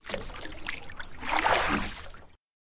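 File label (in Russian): выливание воды из таза после мытья посуды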